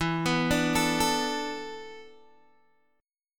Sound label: E 7th Suspended 4th, Guitar, Open G